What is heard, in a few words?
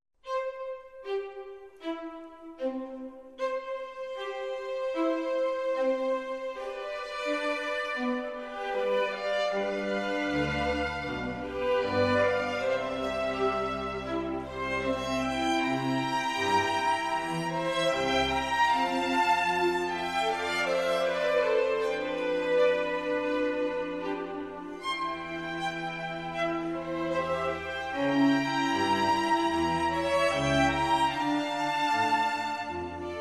Concerto for violin in C major; No 7; Antonio Vivaldi